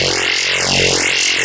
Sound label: beetle idle; Earth and Beyond; EA Games